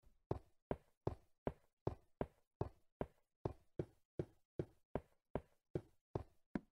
shagi po betonu; minecraft

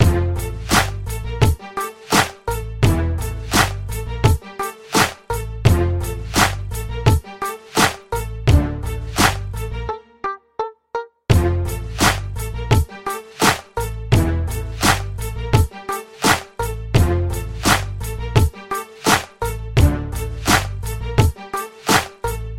muzyka dlya igr

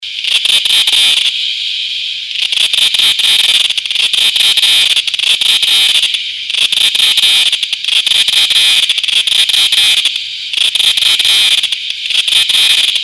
krik cykady

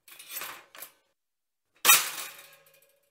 toster zvuk

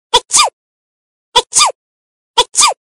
zvuk chiha multyashnyi

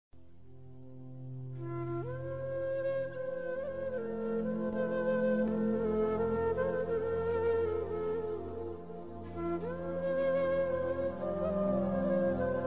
grustnaya muzyka fleity

